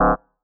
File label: UI Cancel; liblast